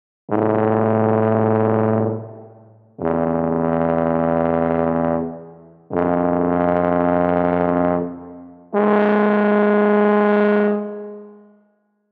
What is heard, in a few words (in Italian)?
TUBA frulato